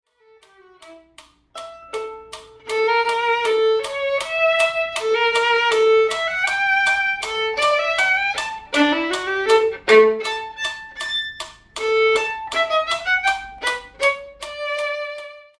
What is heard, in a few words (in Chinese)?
小提琴; 示範演奏; 賽滋 第四號學生協奏曲 第三樂章; 新版 篠崎小提琴教本 第三冊